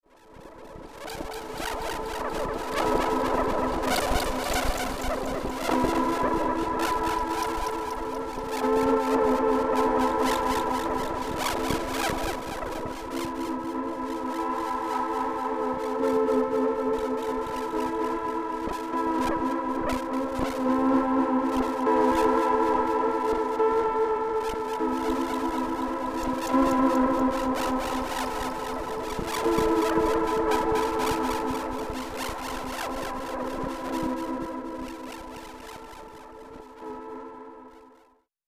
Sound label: Bird Clix; Beds